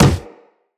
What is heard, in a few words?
Walk Wood, Footsteps; Operator